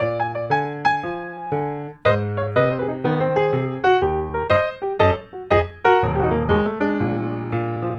piano dry; wizoo

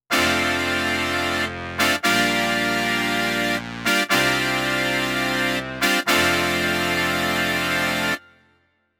son brass; korg

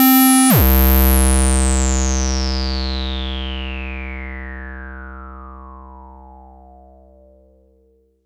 glide square; minimonsta; MiniMoog; OhmForce

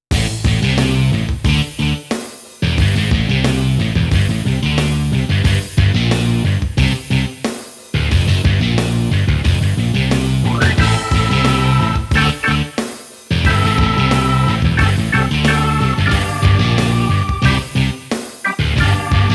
son mix combi rock; korg

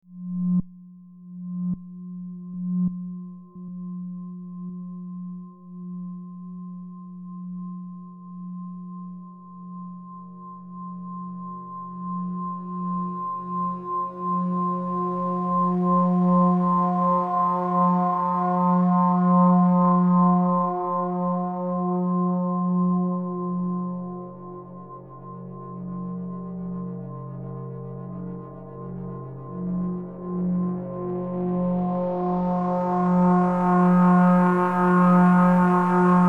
fm8 wet; native instruments

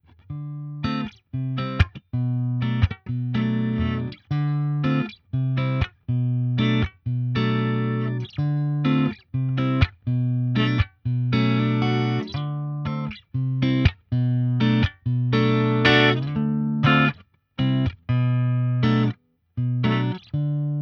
gtr clean r121

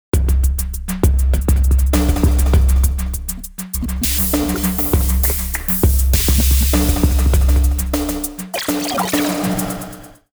delay; sugar bytes; effectrix

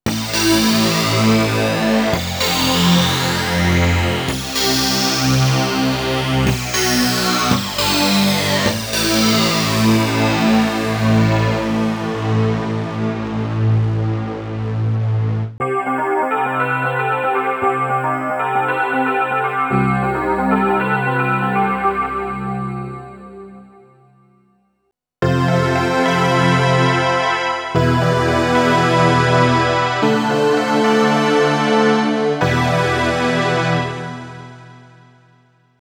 ppg like, waldorf blofeld

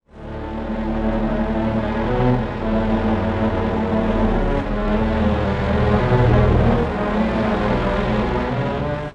12, strings, pitchshift; prosoniq time factory mpex3

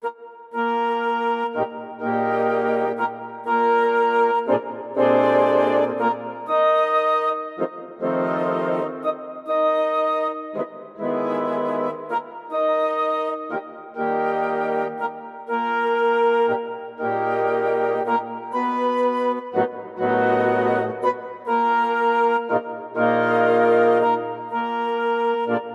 woodwinds, yamaha tyros3

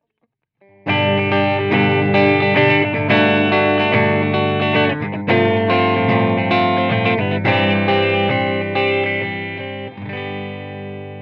clean both bright; brunetti pirata141

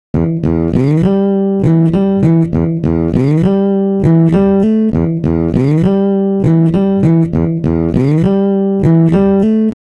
bass

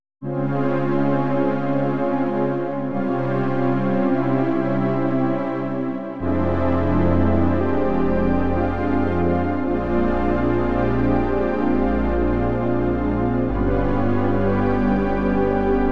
pad

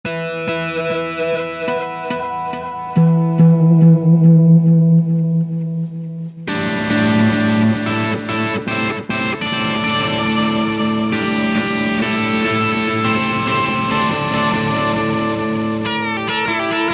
Echopark digital strat blues driver; line6; tonecore; pod

